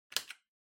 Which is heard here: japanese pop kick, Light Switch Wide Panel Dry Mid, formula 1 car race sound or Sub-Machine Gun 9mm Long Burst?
Light Switch Wide Panel Dry Mid